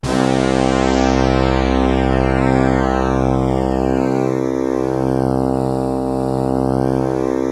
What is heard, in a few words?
BRASS KIN, MORPHEUS; Syntec; Wall of Sounds